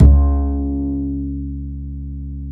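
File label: double bass C#2; Univers Sons; Jazzistic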